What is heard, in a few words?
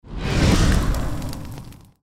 fire, battle